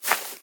grass Walk

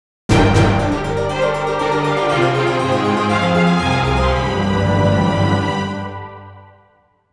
Complete Countdown Mission Success; Pachislot Akumajo Dracula

